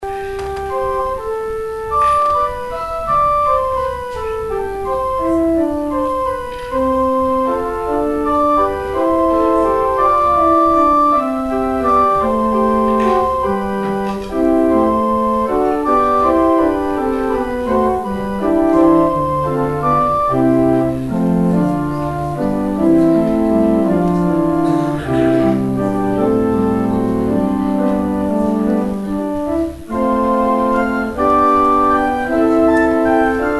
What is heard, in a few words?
organ interlude